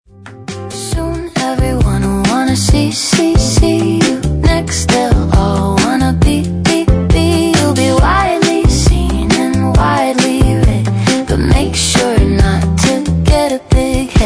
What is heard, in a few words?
Funk Bedroom Pop